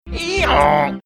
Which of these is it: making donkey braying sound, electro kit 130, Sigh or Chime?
making donkey braying sound